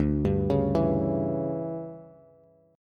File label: D Major 7th Suspended 2nd Suspended 4th D down Bass; DM7sus2sus4; arpeggiated; JGuitar